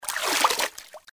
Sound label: Fishing Caught Fish (Small); LG GD900 Crystal; Game Sound